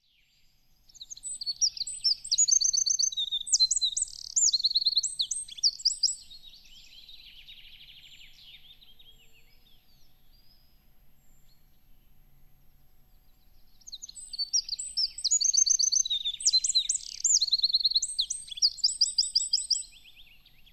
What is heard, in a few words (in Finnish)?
peukaloinen; Metsät